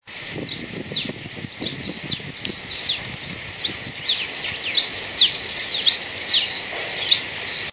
siete vestidos

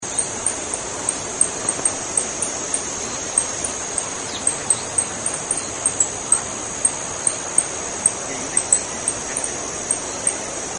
voz jilguero dorado

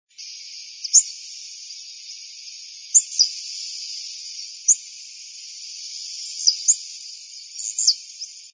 volatinero

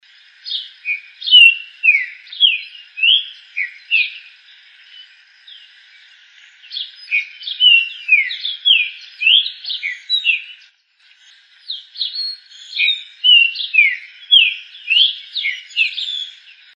voz pepitero verdoso

